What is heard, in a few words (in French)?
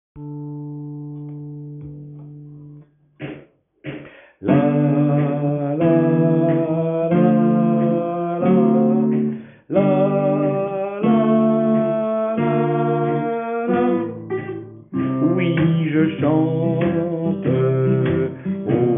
sopranes